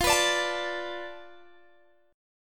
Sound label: F 6th Flat 5th F Guitar Open G